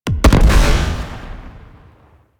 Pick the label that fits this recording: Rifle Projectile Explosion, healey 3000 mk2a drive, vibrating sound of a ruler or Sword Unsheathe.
Rifle Projectile Explosion